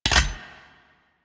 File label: Cock Start, Heavy Shotgun; Weapons; Corinth Prime; Warframe; Tenno